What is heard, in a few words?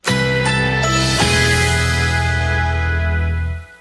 chime; Local on 8s